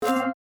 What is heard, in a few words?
chime